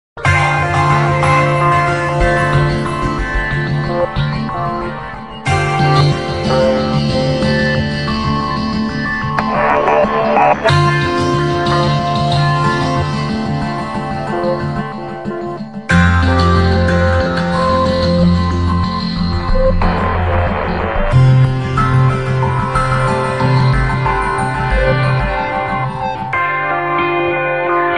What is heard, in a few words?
Electro